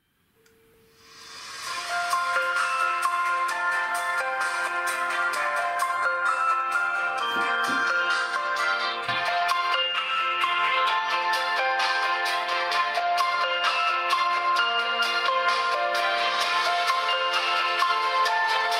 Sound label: Samsung Galaxy A01 vs A02s vs A03s incoming call ringtone